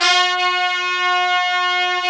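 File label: trumpets swell; Roland LCDP06 Brass Sections; Tpts Sec.1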